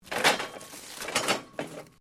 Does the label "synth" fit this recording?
No